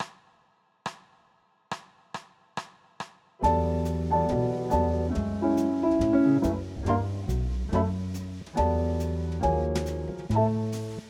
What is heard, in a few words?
E minor blues straight tenor; applied jazz ear training; Practice Perfect